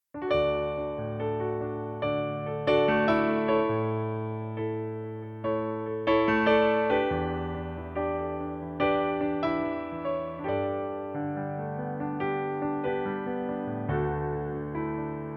Pianoplayback D-Dur

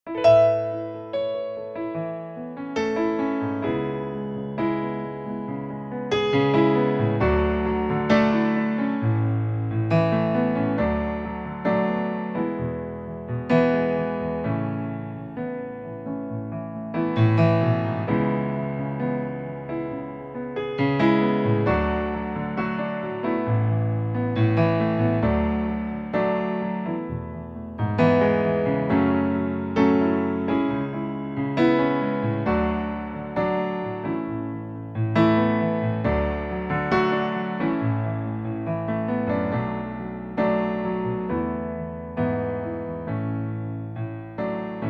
sängerin playback

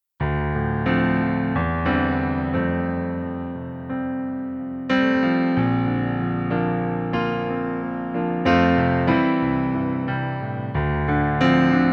Pianoplayback F-Dur

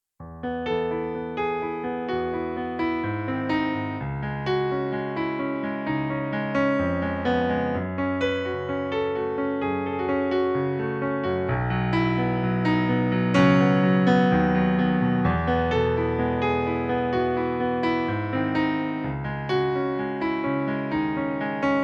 Pianoplayback Sängerin E-Dur

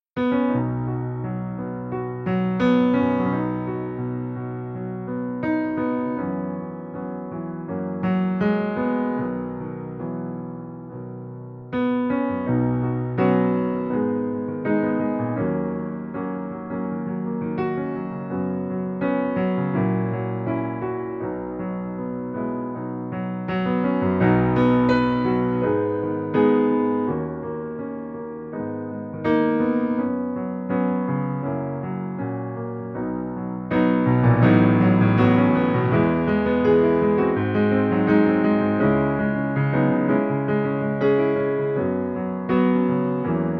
playback sängerin

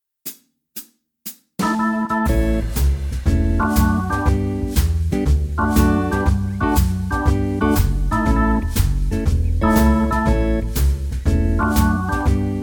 vollinstrumentiertes Playback CoolJazzClub A-Dur

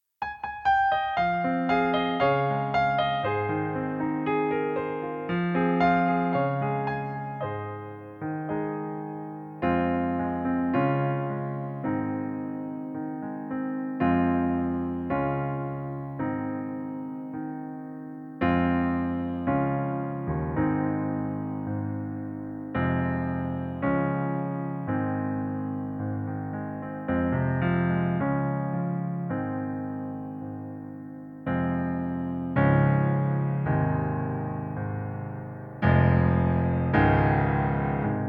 Pianoplayback Ab-Dur

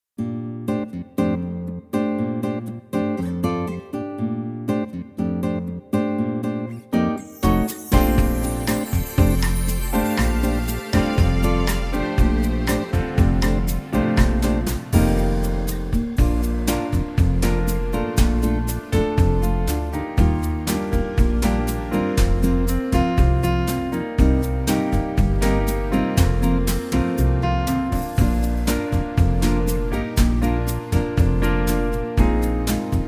vollinstrumentiertes Playback Style BossaN; BossaNova; Bb-Dur

